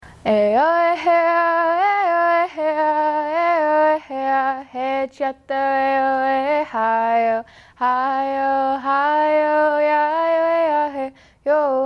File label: singing only